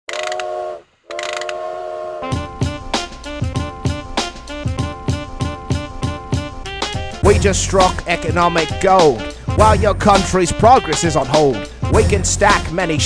industrial diss track FULL SONG

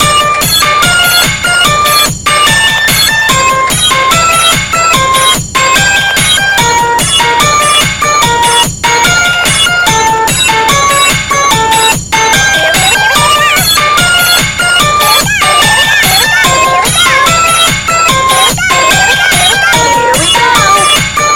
elevator jam; doors roblox; jeux interdits 44

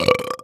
burp male

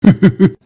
hy hy hy

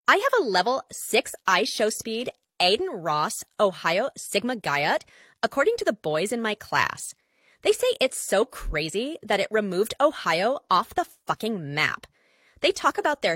fart; fart king

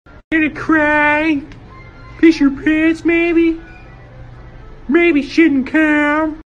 gonna cry; carl wheezer

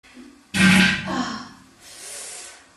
girl farts

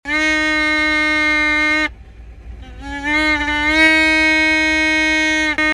horn annoying

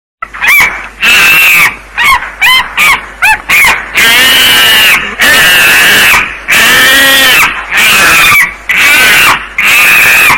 MONKE noise earrape; monki

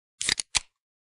soda can opening sound

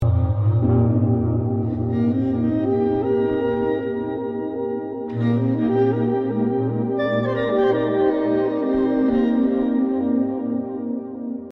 swinging pipe

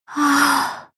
sigh; brynhildr

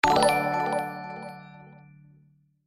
positive win game sound

